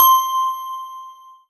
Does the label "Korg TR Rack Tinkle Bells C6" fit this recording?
Yes